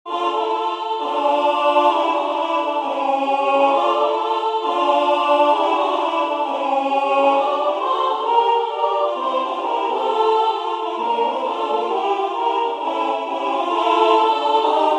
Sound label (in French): Canon des scats Tutti